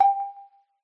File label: decision, button